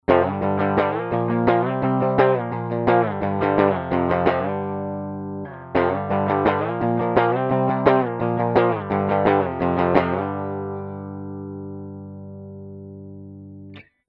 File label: Buffer on backing